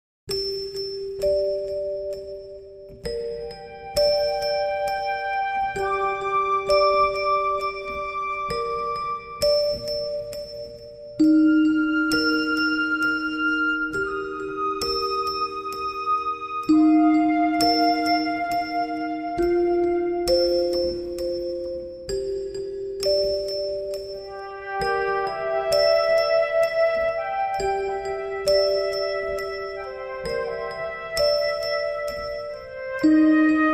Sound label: No Vocals